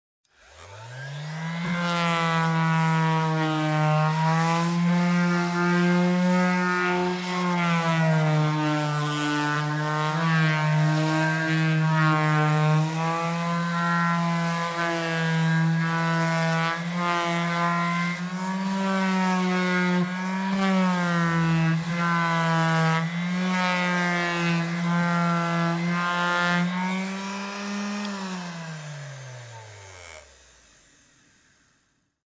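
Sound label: sounds of working wood grinding machine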